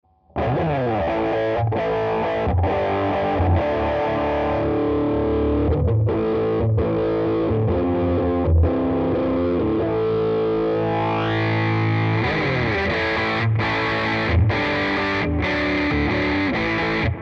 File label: mid Res Cutoff